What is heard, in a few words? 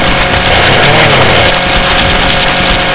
chainsaw gibs, weapons